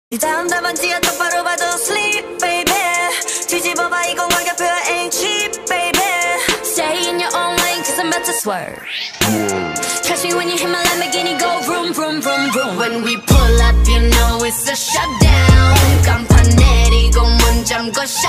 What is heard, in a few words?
korean ringtone